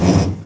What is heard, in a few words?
door end; KINGPIN; doors